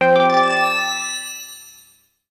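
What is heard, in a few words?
fanfare; Monster girl quest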